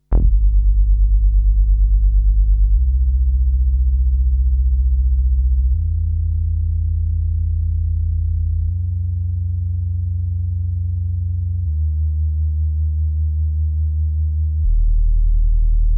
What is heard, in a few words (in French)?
arpege escalier; 1.05min; Variations par palier